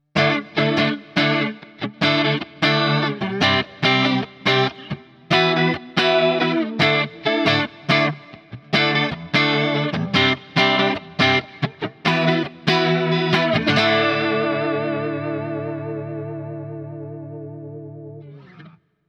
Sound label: Eventide Tricerachorus; Vifasto